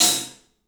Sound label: club hat; 3D Sound; 3D Drums